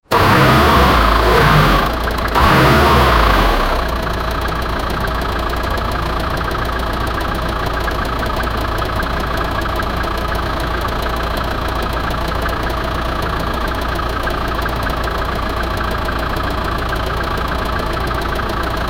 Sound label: diesel engine sound